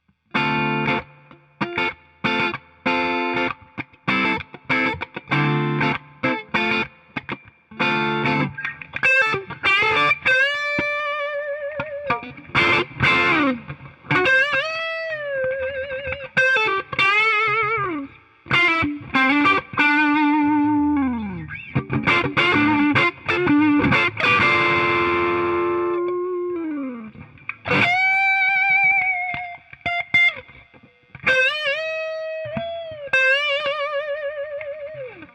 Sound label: Mini Humbucker; Klein